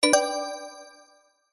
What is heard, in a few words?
mobile game warm collect item